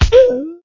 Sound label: Football belly poke recover